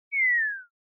Motion Falling Whistle Fast Short

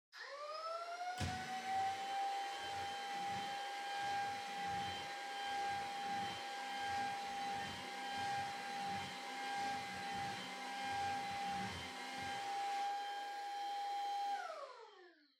Vacuum Cleaner Far Vacuum Floor Low

